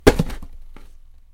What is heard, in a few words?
punch through